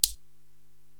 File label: Cabasa-Tap; Korg TR-Rack